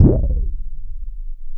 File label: BASS, Drum & Bass, 160 BPM